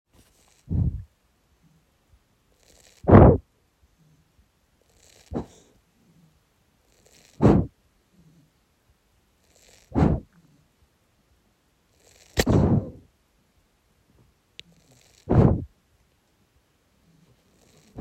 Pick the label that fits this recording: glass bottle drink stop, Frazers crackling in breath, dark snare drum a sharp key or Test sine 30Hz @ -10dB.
Frazers crackling in breath